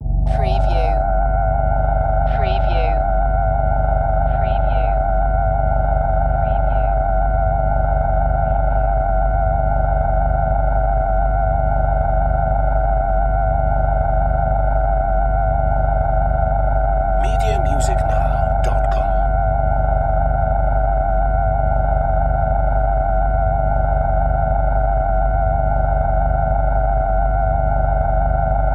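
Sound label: Alien Warning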